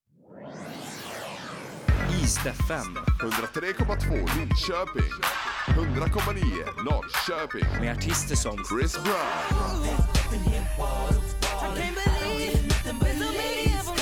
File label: radio spot jingle